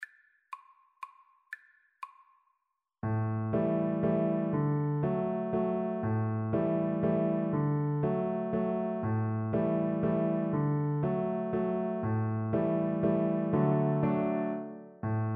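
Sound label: VLC karaoke, Cello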